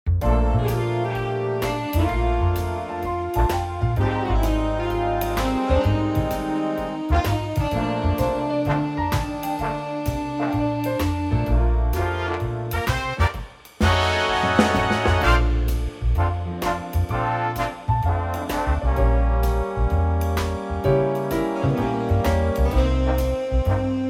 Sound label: Bb Big Band